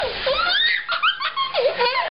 Laugh

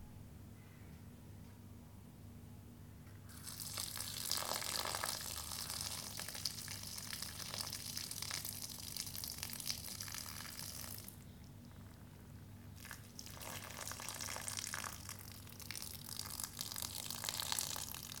Watering Plant

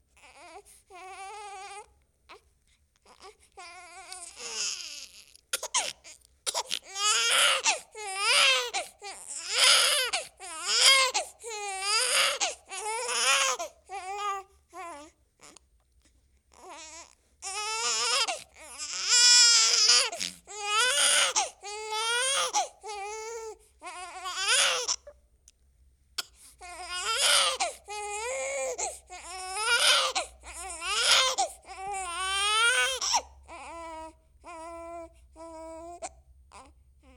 Baby Crying

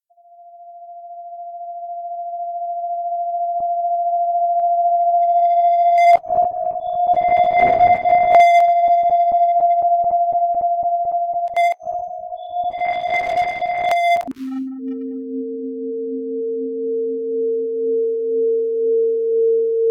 Sounds Before Death

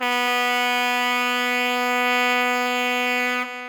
Fire Alarm

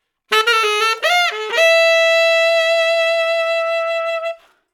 90bpm Latin Twiddle

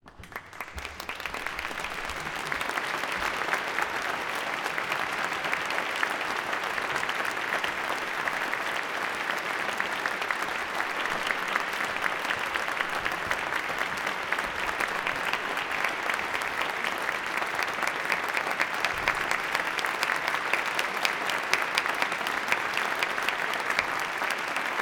Applause Audience C 200 Large Hall

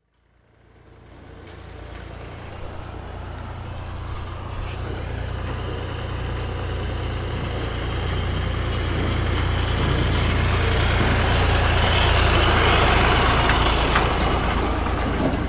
Slow Noisy Tractor Passing

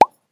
Pop

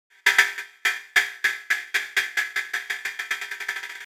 Ping buzzer

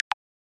Click

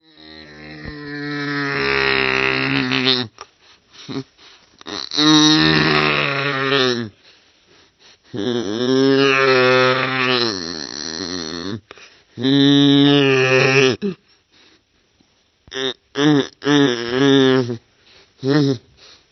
Baby Crying Slowed Down

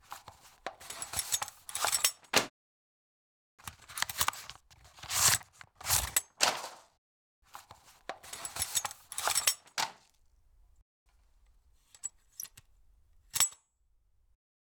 Mail Slot

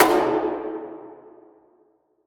Echo pop

